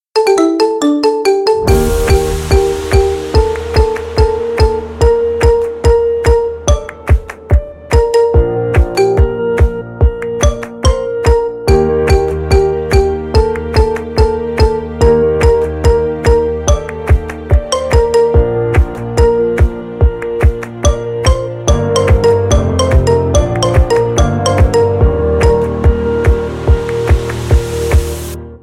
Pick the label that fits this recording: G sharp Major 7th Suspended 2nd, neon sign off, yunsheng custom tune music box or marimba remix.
marimba remix